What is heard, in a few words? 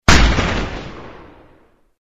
explosion